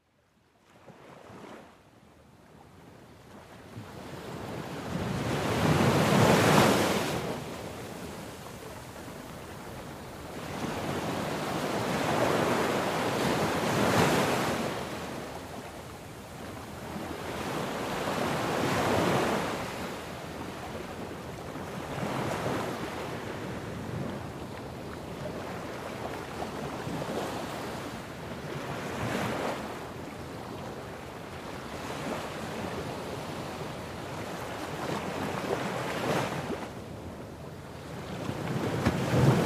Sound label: ocean waves